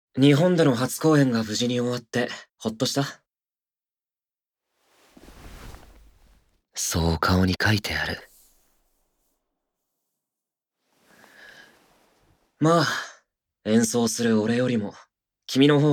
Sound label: pianoforte